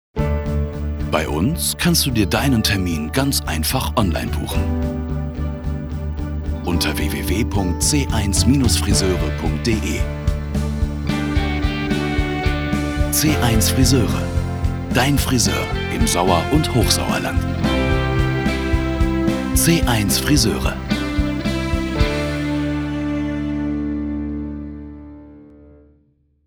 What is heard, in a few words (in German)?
Jingel; C1-Friseure